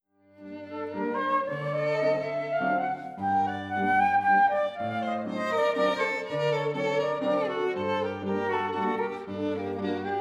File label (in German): Walzer für Flöte Viola und Klavier